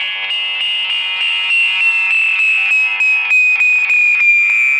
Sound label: FX Guitar; Guitars